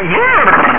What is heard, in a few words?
BRRRR; AUSRUF